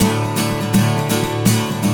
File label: Acoustic Guitar; Guitars